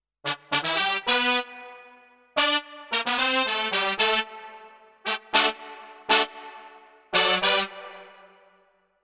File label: Roland EA7 Pop Brass